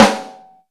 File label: SNARE, Ragga & Dub Reggae; USB Soundscan vol.27; AKAI; SNARES KT